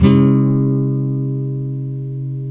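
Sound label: NYLON, D MINOR DOWN, CHORDS; Spectrasonics; Hans Zimmer Guitars VOL-1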